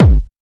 sharp steel kick drum sound a key, kick drum single shot tuned to the A